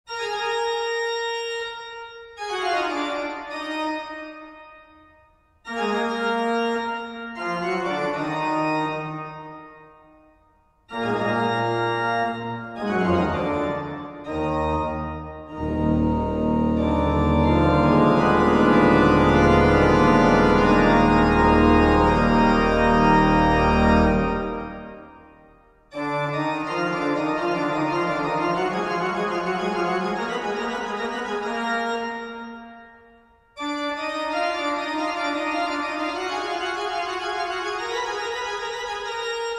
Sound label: bach toccata